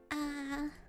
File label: aaaah; noises